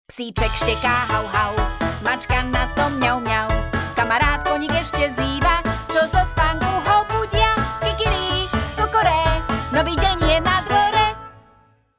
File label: Female Slovak sing, slovak-female